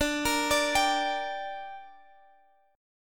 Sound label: D Suspended 4th Sharp 5th D, down